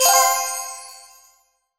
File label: pick up treasure; Gold Miner Joe